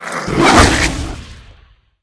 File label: two headed hound attack, monster